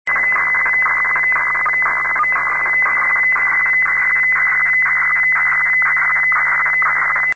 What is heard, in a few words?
fax